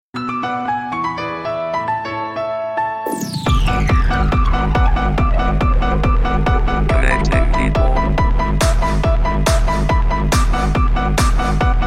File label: remix nokia, hip hop ringtone